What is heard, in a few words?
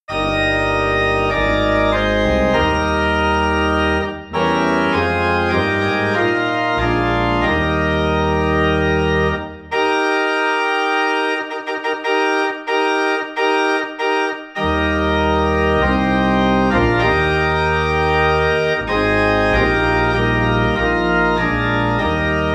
Organ in E Flat; God of our Fathers